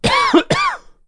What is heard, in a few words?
Strong Double Cough